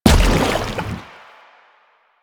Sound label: Step Default, Footsteps; Warframe; Lasrian; Lasrian Soldiers; Foley; Lasrian Chem Strike Nox